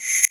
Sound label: RevCas, BossDR660 fx; drum machine